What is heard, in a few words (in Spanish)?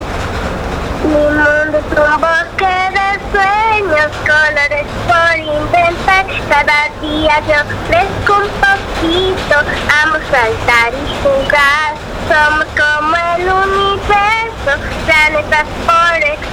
Cantando